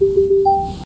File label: Door Chime; Babylon 5